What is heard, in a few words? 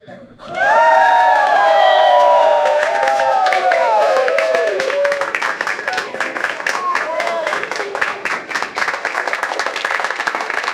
create the sound of a, applause